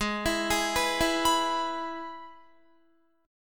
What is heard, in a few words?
Minor Sharp 5th, Guitar; G#m#5 arpeggiated; Gsharp; 16,19,18,16,16,18